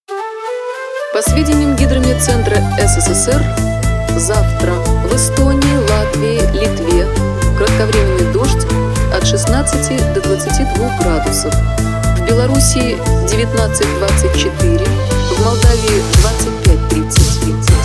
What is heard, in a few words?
Italo Disco Remix